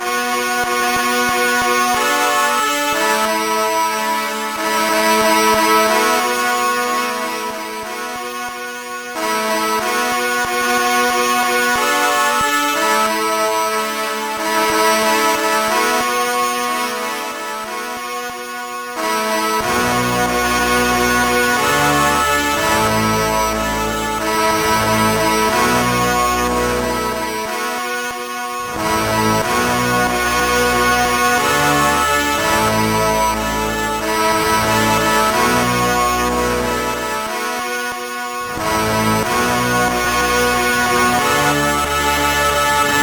soul fanfare; MED

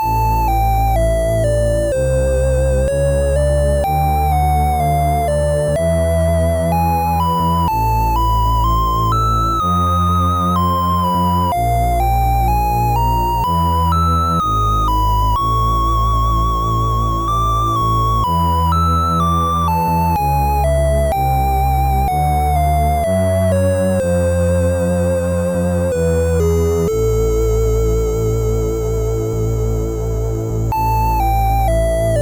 chromatic; MusicLine

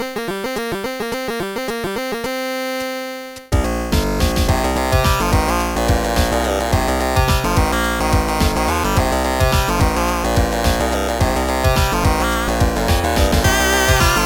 swing blues; swing-gling; Trackers; Modland

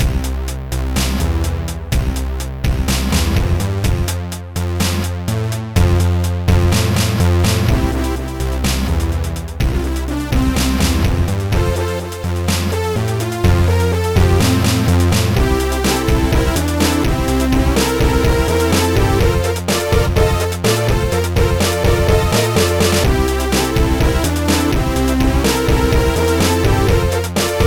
techno blues; Trackers; Modland

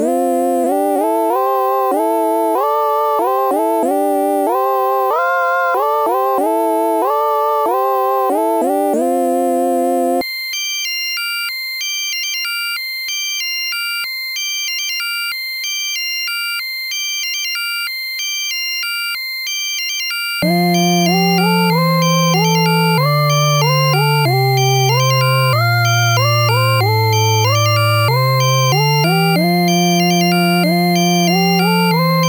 quartal; MusicLine

